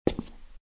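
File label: footstep concrete walk; Casanova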